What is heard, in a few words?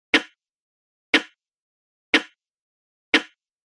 Faux Snare Loop 120 Bpm; Royalty Free Drum Loops; Percussive And Rhythmic; Seamless loop